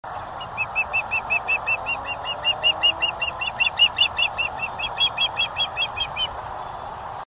osprey, long, loud cry of male with female present choosing between two different nest platforms